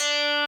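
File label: kawai k1 acoustic guitar; wavetables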